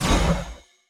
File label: Energy Gain Whoosh